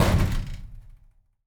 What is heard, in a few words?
construction impact